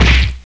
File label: Empty Space; ESAN VO Sound Schemes; ESAN MK Sound Packs